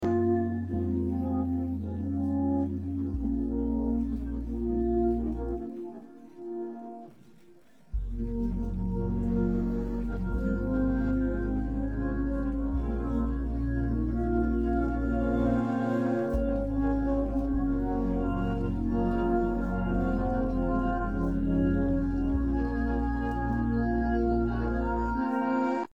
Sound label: no vocals